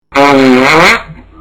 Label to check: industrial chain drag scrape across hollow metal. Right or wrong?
Wrong